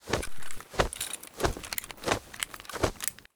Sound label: sprint pistol; STALKER; IWP